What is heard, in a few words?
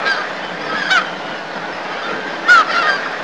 GULLS, ESTUARY; Zero-G Ambient 1